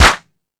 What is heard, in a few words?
House Machine, Drum Machines